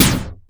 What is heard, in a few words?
PERC, effected drum; Crypton - DrumBase5000